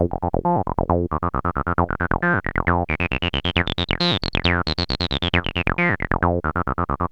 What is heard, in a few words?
TB-303 LOOPS